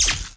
elec

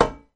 metal

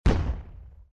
explosion

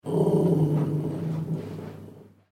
aggro, monstres; deadmaze

